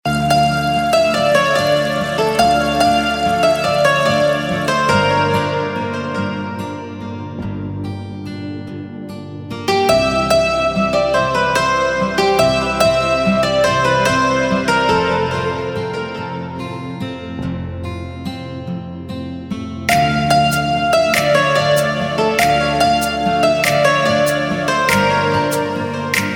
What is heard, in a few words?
Instrumental Ringtone; Bollywood Hits (2015)